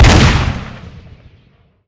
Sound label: shotgun shoot